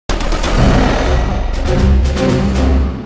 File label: Fight Lose; music; Silas; The Da Vinci Code; XBOX